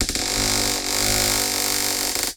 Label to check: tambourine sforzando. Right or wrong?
Wrong